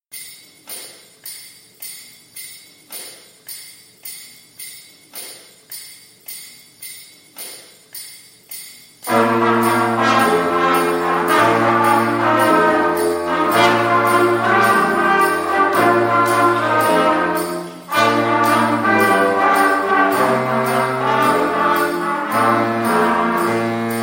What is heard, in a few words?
Trumpet Ensemble